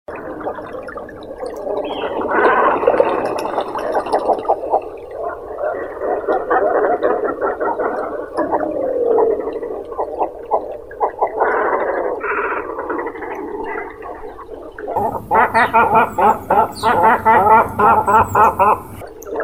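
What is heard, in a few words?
Temminck's cormorant, Phalacrocorax capillatus; ussuriiskii baklan; zvuki prirodi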